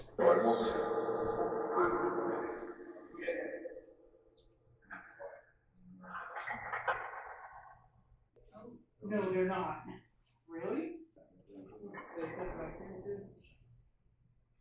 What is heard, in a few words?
Tattoo Shop Phasma Box; After Hours Tattoo Studios – Return Investigation 2024